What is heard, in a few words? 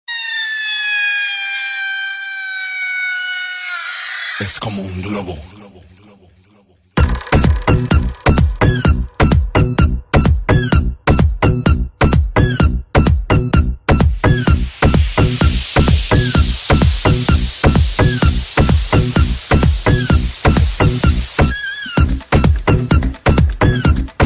latin electro